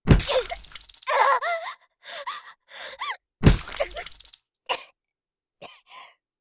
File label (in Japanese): 刺され; 効果音あり